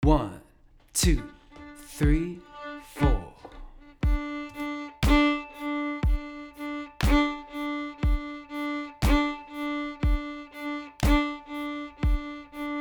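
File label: offbeat accents train open d, violin; offbeat accent patterns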